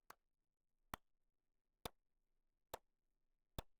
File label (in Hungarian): taps fuggoleges